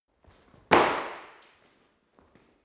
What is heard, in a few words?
impact hard indoors